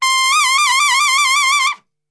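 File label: C trumpet shake; Best Service; Brass Super Section; TRP SHAKE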